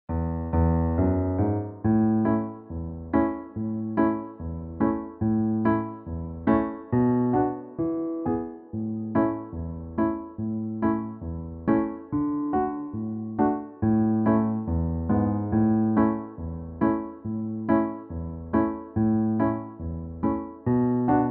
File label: Reel Piano; Mrs McLeods Reel